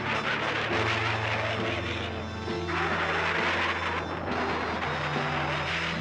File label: Dragon King Roar